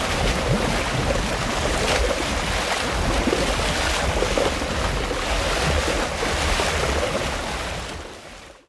ice water swell; Rapids

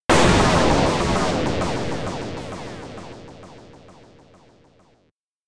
Boss SFX; Xak II Rising of the Redmoon; X68000

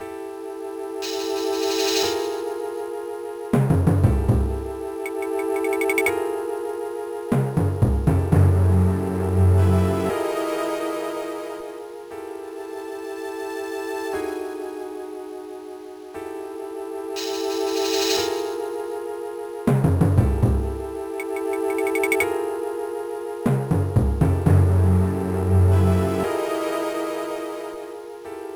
Environment Sound (Tension); Metroid Fusion 2002 GBA

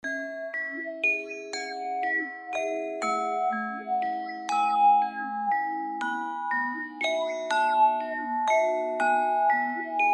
music minigame; dragon fin soup

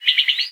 bird random

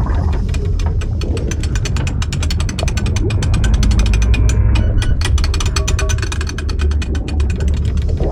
lava wheel; Level4 Hidden World

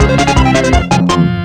Level Success Music; Spookware

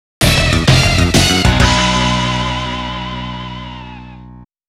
Race Fanfare; Biker Mice from Mars

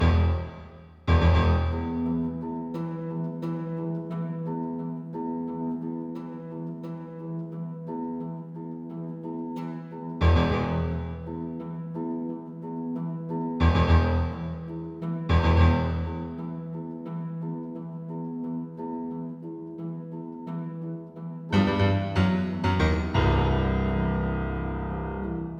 Music BattleC Layer2 Syn; Plants vs. Zombies Battle for Neighborville; MushroomBoss

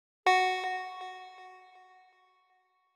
Town Intro Chip; backpack hero gamerip 2022

